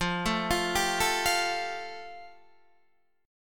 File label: F Major Add 9th, Guitar DADGAD